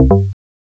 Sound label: win; GoldSrc